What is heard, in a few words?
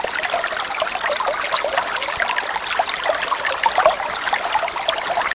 water flowing